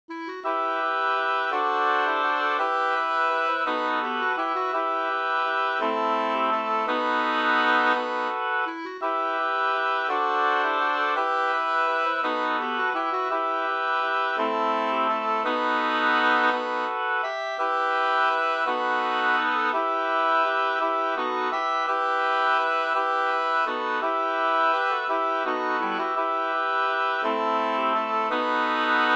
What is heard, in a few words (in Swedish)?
vismelodi; Kristallen den fina